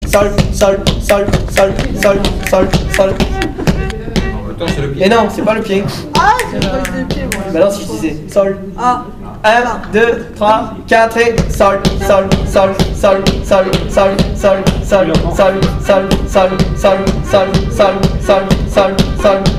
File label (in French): le sol au violon; Finish set; Musique d-ensemble